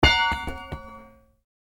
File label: metal pipe drop